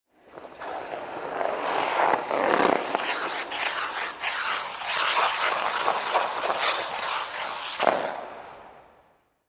Ice Skate Spin, Ice Skate Spin Twirl Rink; Ice Skating; Sports

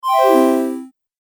8 Bit Style Harp Down; Video Game Sound Effects

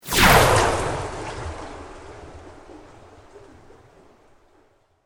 Sweep Organic Medium

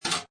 Pinball Sound Metal Plates Click; Video Games

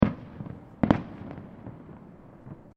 Fireworks Multiple Firecrackers; Fireworks (Sound Effects:Backgrounds)

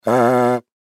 Mouthpiece Blow; Miscellaneous Musical; Blastwave

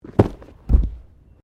Body movement Fall Medium Double; Body movement foley to simulate falls and deaths; Wrestling And Struggling

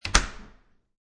Door Close, Metal Door; Household; Metal Door Sound Effects

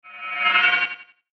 Logo Delay Whoosh; Sonic Logo; Blastwave; Sonic Logos; Production Element Imaging Element Accent Transition

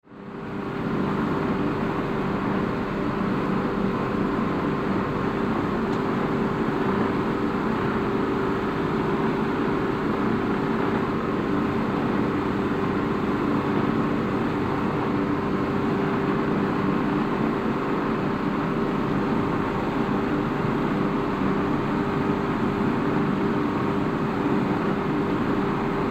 Yacht Drive Interior Cabin; Boats (Sound Effects:Vehicles)

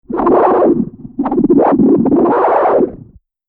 Chaotic Electronic Wind, whoosh; Andrew Fleming

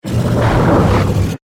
Hit Warble Flange; Hits; Sound Design; BlastWave

